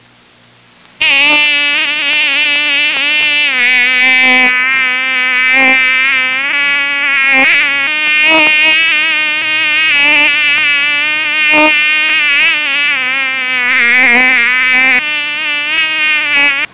Mosquito sound

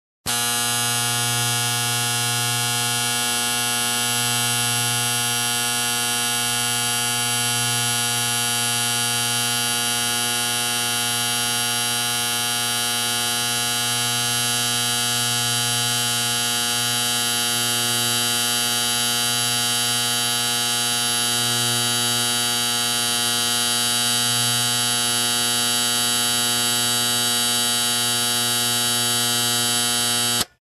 Electric Shaver

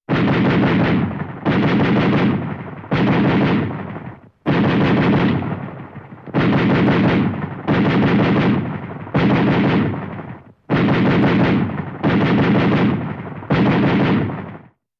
Semi-Automatic Gunshots

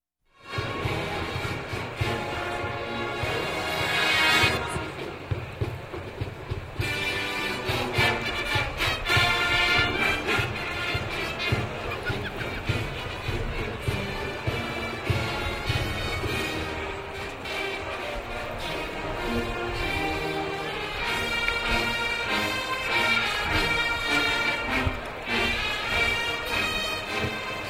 Brass parade at city

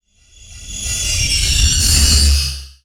Progressive iron screech

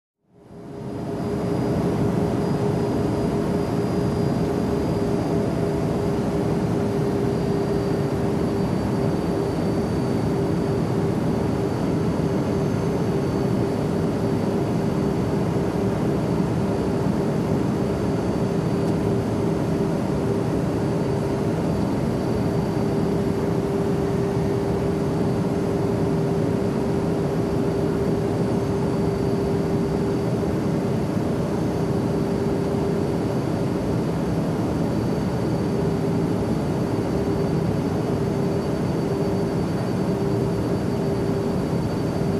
Airliner Cabin Ambience

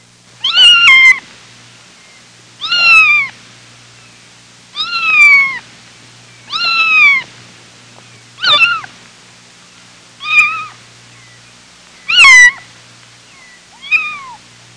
Meowing kitten

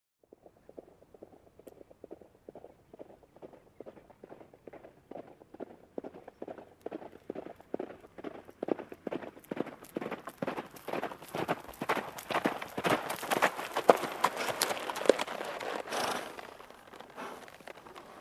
Horse Gait on a sand surface incoming

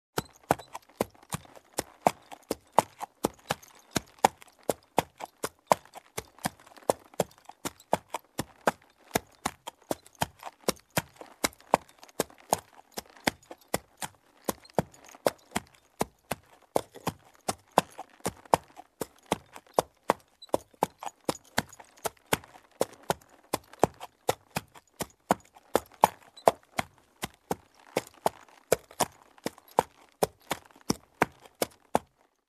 Horse Gait with Saddle sound

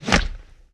Swipe Punch on a wooden dummy

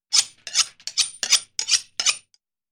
Sharpening knife

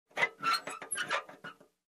Squeaky Metal Cover Noise

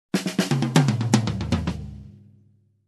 Slower drum fill

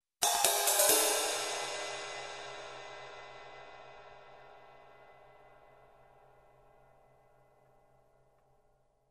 Fast Cymbal Hits

